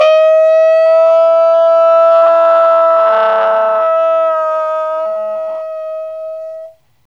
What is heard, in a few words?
TRUMPE, Trumpet FX; Roland - LA Composer vol. 2